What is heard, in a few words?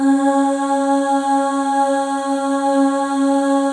Voices, FEM AHS; Spectrasonics; FEM.2 AHS